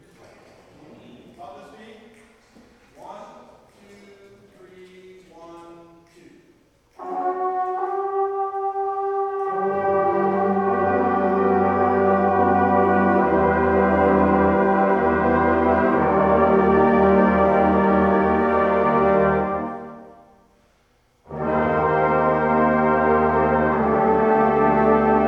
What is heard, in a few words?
Warm Up, brass